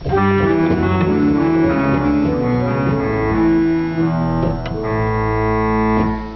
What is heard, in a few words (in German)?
Pedal